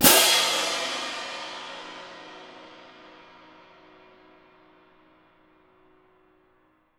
CYM F, Percussion; Miroslav Vitous - Percussion & Harp

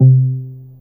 Pizz Low C2, PizzStr; Fairlight CMI IIe

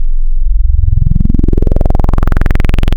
Classic Res, wavetables; Waves Codex

